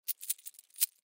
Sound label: Coins Shake Light; Money; Foley; Money Sound Effects; Objects; Blastwave